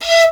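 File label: piccolo staccato E4; Flutes; DirectWave; Varazdin Orchestral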